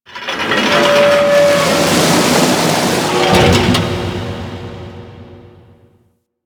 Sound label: Ocean Hatch Large; Warframe; Grineer Ocean